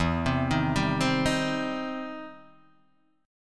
E Minor Major 7th Sharp 5th, E, down, Guitar, Open D